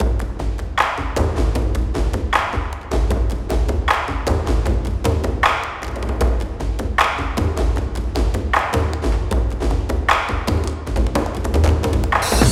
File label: Drums 154.675